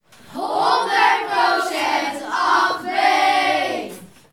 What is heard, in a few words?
Jingle